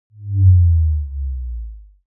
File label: cinematic impact bass drop simple soft